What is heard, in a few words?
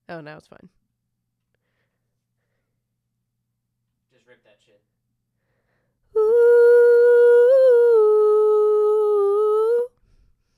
vox harmony